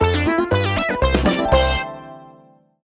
large Win; netent; flowers

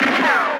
spin Button Click; netent; reelsteal

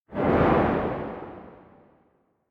bonus game Swipe; netent

